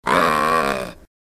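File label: porcupine middle wound; dikobraz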